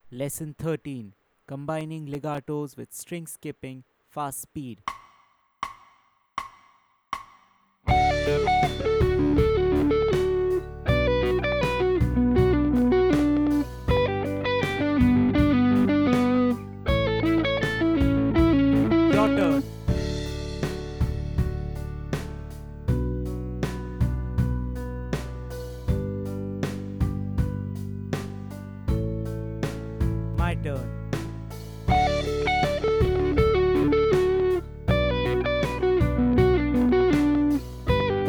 Combining Legato and String Skipping Playthrough Fast Speed, lead guitar; The Art of Lead Guitar; Legatos and String Skipping